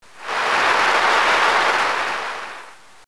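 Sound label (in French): feuilles; NATURE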